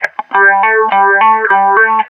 GTR, Roots Funk; USB Soundscan vol.41; AKAI

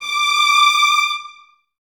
Violins D 6, String Ensembles; Miroslav Vitous; LINKS ORCH

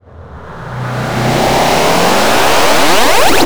WIPPERSNAP, Trance; ILIO; Trance Fusion; STOP LONG

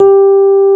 Electric Pianos; Wizoo Electric Pianos

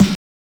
Snare, Drum and Bass

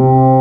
SITAR C3; Big Fish Audio; Synth City

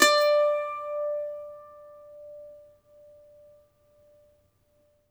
MANDOLIN, Plucked Strings

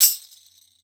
TAMB; Xsample VOL-9

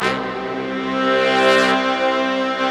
big brass, Slow Big Brass; Optical Media International - Sonic Images Library

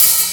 OPEN HH; USB Soundscan vol.51; AKAI

